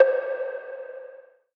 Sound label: Perc - RnB; Drum One Shots; Toronto